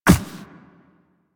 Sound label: Walk Ice, Footsteps; Warframe; Operator